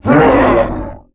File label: Orc death; Enemies; Dungeon Defender; DunDef